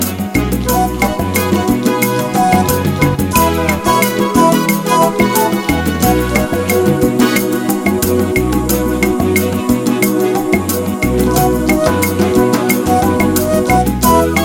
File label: Hot Salsa